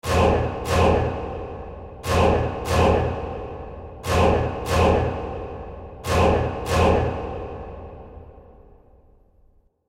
Impact Trailer Horror Vocal Orchestral Scary Repeats; Dark Choral ( Repeats 4x ' S ); Sound Design; Trailers